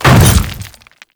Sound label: Step Dirt, Footsteps; Warframe; Grineer Hammer Boss